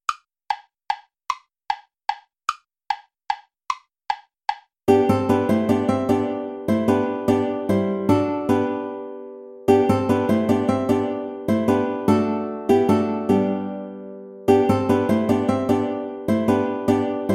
volta play along without guitar; praetorius michael

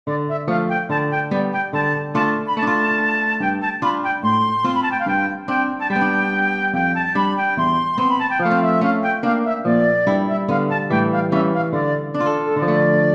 romanian folk dance for flute guitar

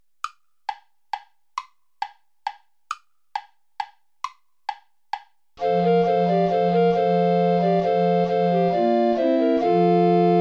volta play along without soprano recorder; praetorius michael

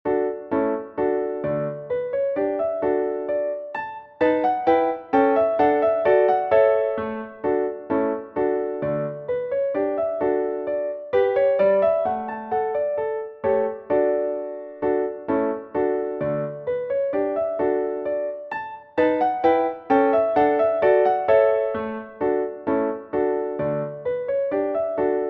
gavotte; zilcher paul

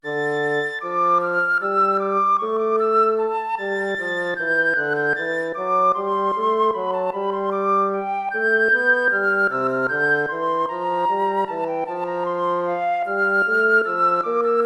air, hornpipe

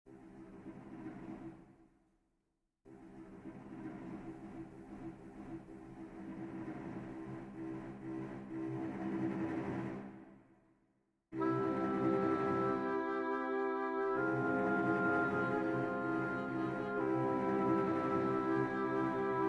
march, for winds strings; purcell henry; from the music for the funeral queen mary

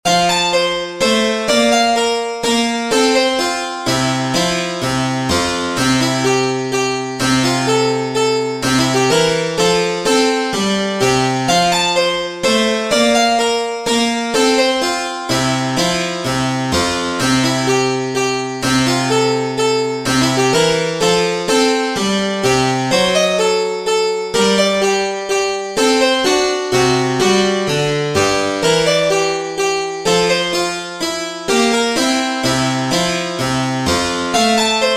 minuet f major; mozart leopold

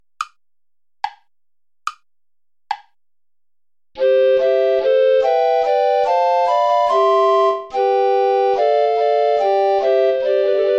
play along without bass recorder; praetorius michael; nach gra ner farb mein herz verlangt

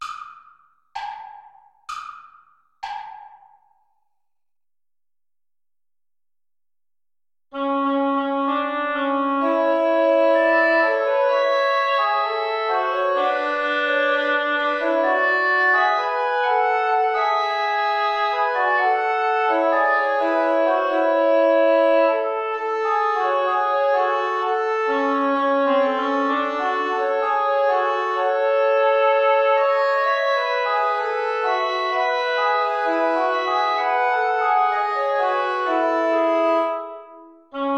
tango play along without guitar; albeniz isaac

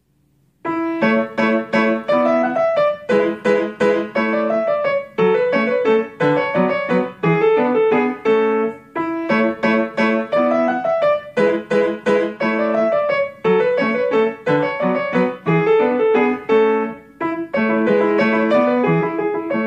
german dance; beethoven ludwig van

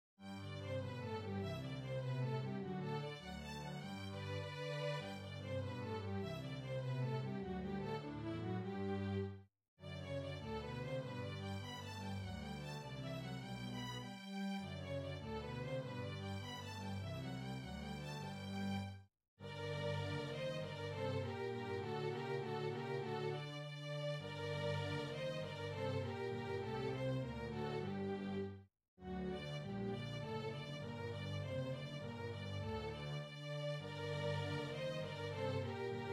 english dance; vanhal johann baptist

